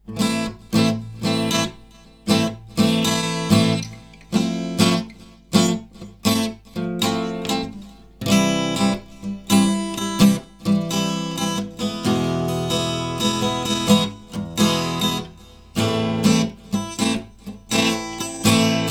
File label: WESTERN GIT